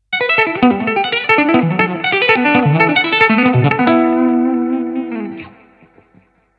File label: Lick; Guitar Club Maggio 2025